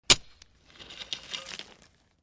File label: Pick Ax Hit / Drag; Single Hit With Light Debris